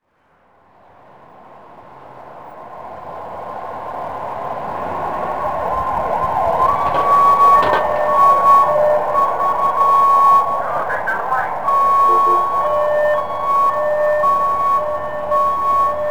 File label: Ambulance siren US; ambience; Project Gotham Racing 2